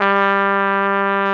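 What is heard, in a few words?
Trumpet f, Solo Brass; AKG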